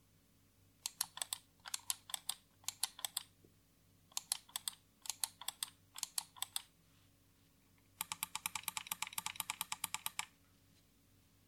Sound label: Shoulder; LIFT 2 ERGO